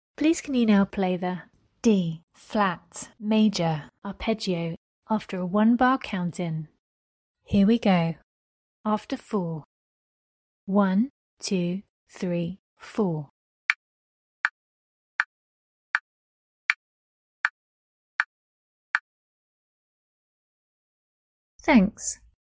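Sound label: d flat major arp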